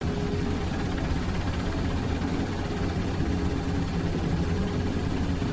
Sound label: helicopter